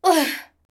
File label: Grunt; Lewd SFX